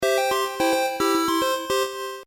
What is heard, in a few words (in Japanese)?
てーれれって